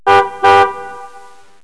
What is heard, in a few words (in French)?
poutpout